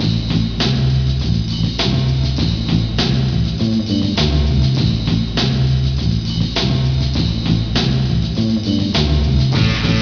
radio music; de prodigy2k

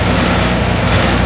Hum Turbine

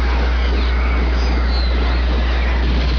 vortex; de stargate

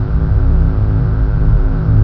EMP Zone

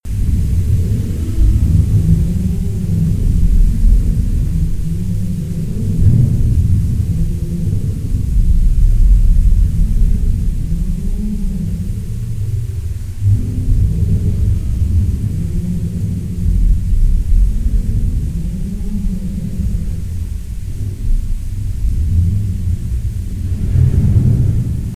ambience subway; awp xmas streets

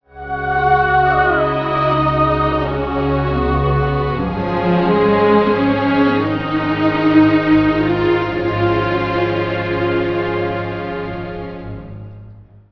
band; breeze

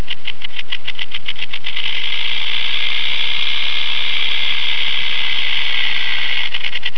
Rattlesnake

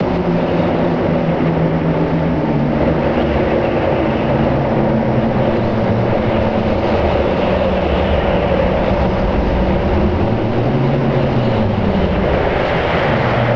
bg Start

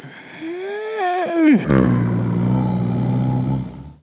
zombie idle; mystic radar; mr zombie1

